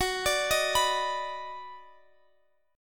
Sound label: D sharp Minor Major 7th Sharp 5th F sharp